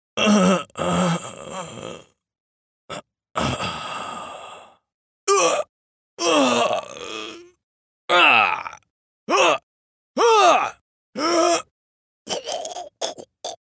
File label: Death Sounds; en-GB